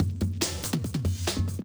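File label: drums, Hardcore Breakbeat, 145, C minor; Big Fish Audio; Hardcore Breakbeat Action; Decimater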